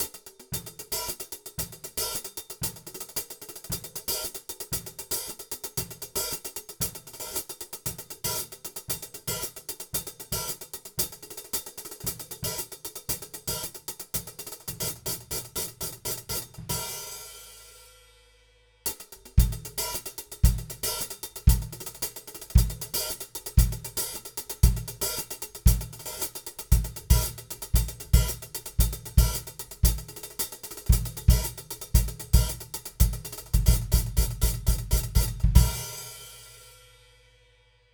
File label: HiHat; KM84; motu 828 mkii audio conversion